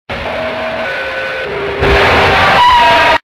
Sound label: noisy ghost